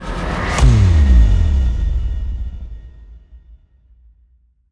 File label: Bass Drop; SoundsCrate